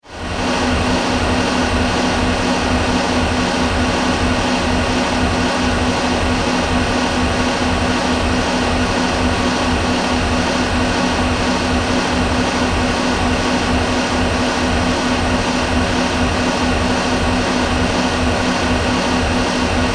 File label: Power Factory, Ambience, Science Fiction; 5.1